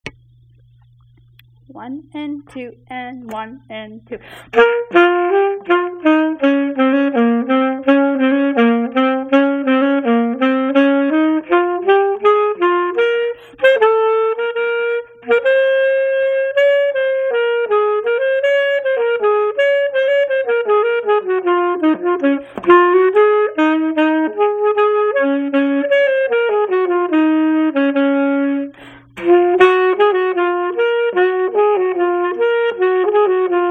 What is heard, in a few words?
duets duet i part 1